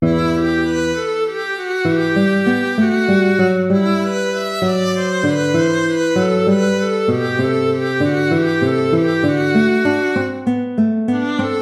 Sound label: gigue